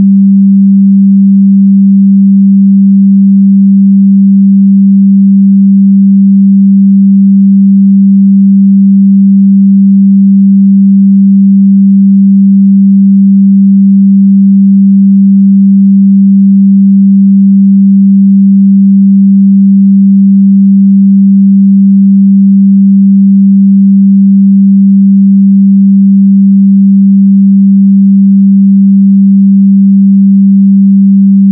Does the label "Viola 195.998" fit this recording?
Yes